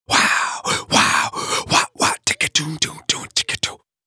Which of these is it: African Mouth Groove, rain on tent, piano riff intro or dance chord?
African Mouth Groove